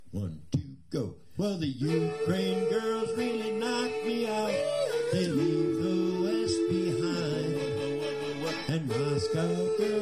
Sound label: bridge vocals only